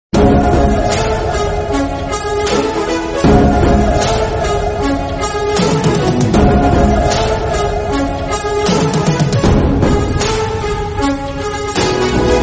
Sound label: bgm telugu; akhanda; bala krishna